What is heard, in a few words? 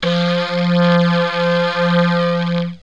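clarinet chorus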